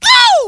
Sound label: spy pain severe; spy jackson